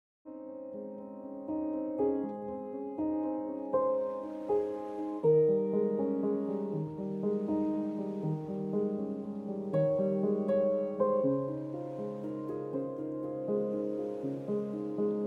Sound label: background music